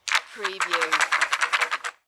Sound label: Billiard, Pool, Snooker - Rack up balls in frame